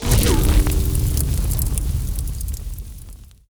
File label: skill black cannon boost